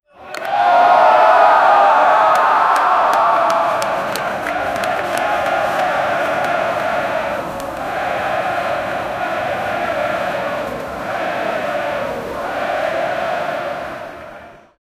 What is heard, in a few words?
crowd singing during a fo; crowd noise